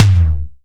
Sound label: Tom